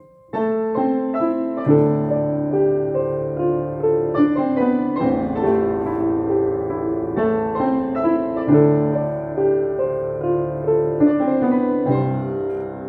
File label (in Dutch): Piano tempo 70 alleen piano